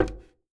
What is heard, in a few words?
int chair hit metal counter